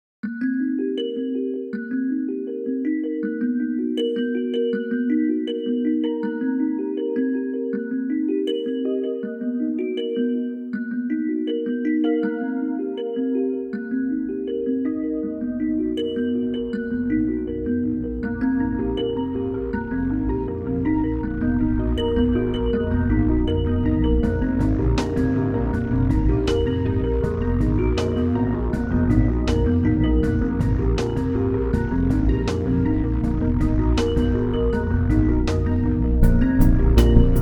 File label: Ambient